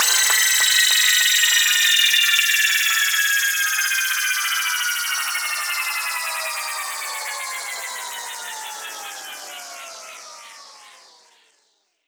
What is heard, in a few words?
textured faller 150 8 bars; paragon